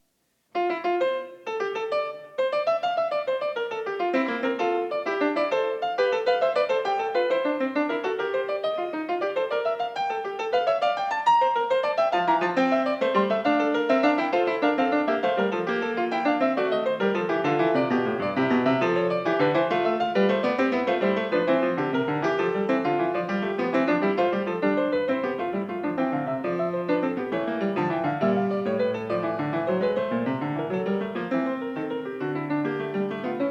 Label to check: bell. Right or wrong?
Wrong